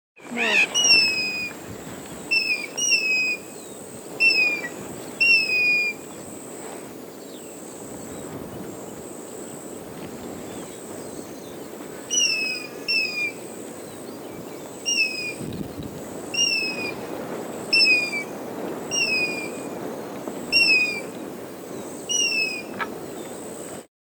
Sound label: African Hornbill